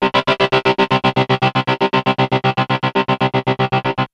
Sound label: GTR, Funk Guitar; 90 s Sample CDs; Zero G